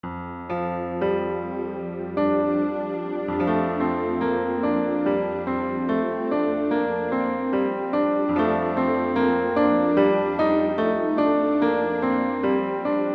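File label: accompaniment high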